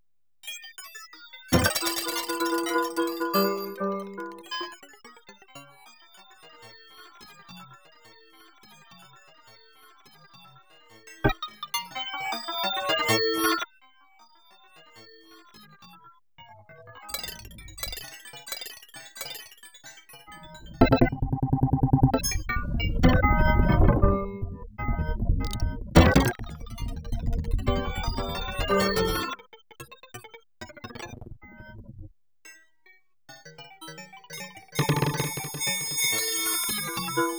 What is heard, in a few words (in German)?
drehorgel